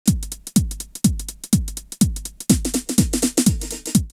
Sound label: 909 124, drumloops, DrumMachines; X-Static Goldmine; 90 s Sample CDs